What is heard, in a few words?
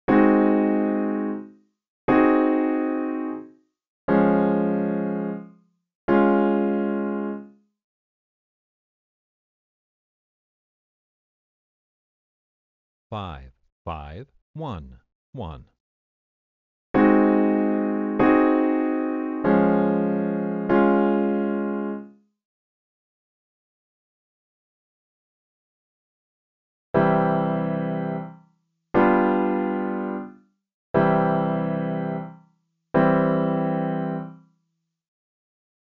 I, V7, progressions